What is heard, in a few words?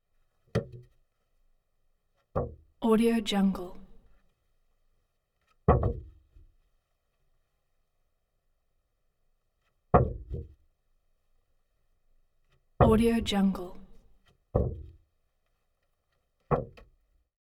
trash can impact plastic